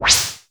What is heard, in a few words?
BURST, Prophet Five; E-MU Formula 4000 Series Vol. 3; Analog Odyssey